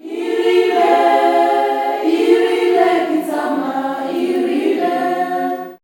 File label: GIRL CHR, FEM. CHOIR; Spectrasonics; Heart of Africa 2